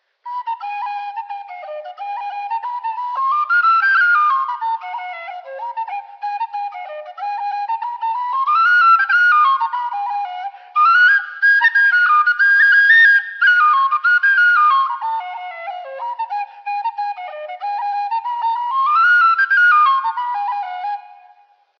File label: Freeman Tweaked C Generation Hornpipe, whistles